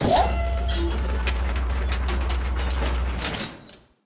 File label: Metal Squeaky door